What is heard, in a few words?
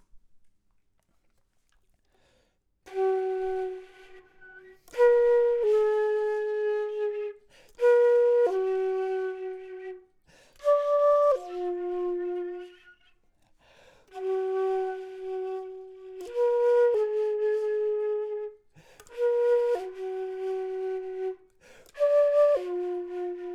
flute; logic